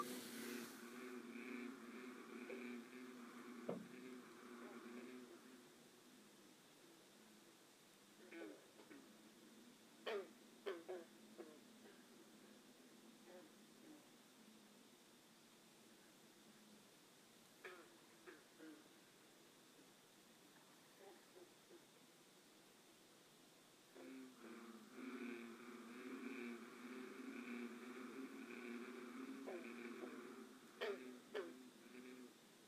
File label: Lotsa frogs